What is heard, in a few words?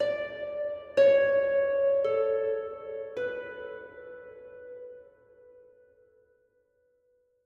Harp High; gamerip; that dragon cancer